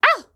Spa Hit

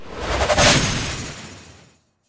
Wind Blade

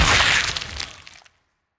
Vampire Tear

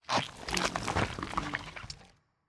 Mushroom Idle

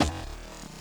Footstep; Mars Sortie

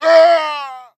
Male Death; Mars Sortie

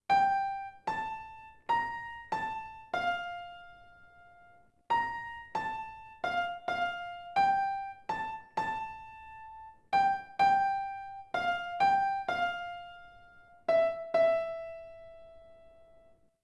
ending piano; Kono Aozora ni Yakusoku wo; melody of the sun and sea; PS2

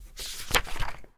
Pop Quiz Page; Legend of Keepers (gamerip) (2019)

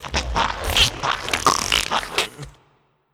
Revive; Skell

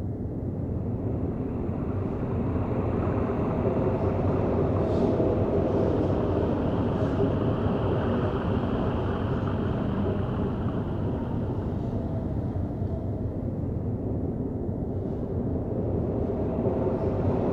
Atmos Archives; Hollow Knight

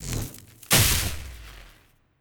Valkyrie Attack; legend of keepers gamerip 2019

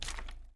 Sliming

